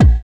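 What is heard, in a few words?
BASS DRUM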